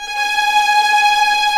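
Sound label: G#5 tremolo, String; East West; The Ultimate String Collection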